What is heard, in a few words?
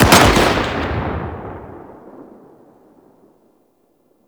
Sniper Shot 3D; Warframe; Tenno